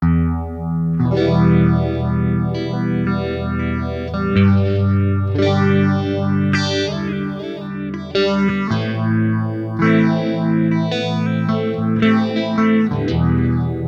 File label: Little Phaser Organ